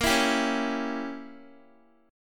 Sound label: Bb Diminished 7th Bb Guitar; Bbdim7 strummed